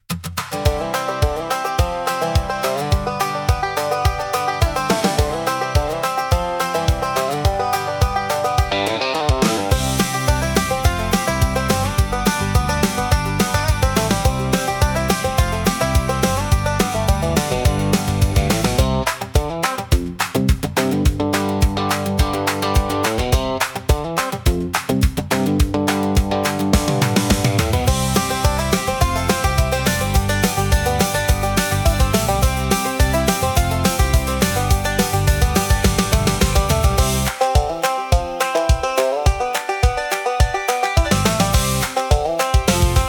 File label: Sun On The Highway, country acoustic